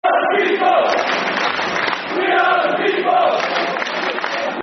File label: We Are The People, Football Chants; Rangers GRFC